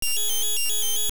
Dizzy; Link dizzy (after punched by Blaino)

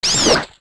Keese Die

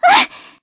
Gasp; Zelda gasping; zelda sounds; Skyward Sword

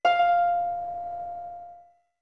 Notes Harp F long; OOT

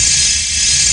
Grab Hold; Zelda's magic grip on an opponent (loopable)

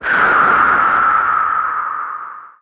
Launch

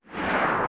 Slide; Epona sliding to a stop; zelda; OOT

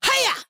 Attack

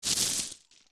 Scarecrow Shake; zelda; OOT

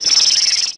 Teleport Magic; Zelda Teleporting (magic sound)

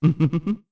Laugh; Zelda

